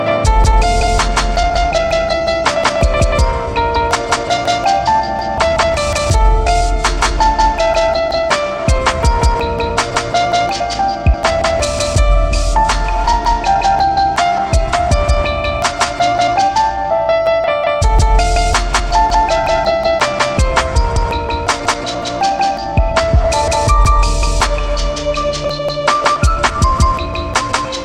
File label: Piano Mix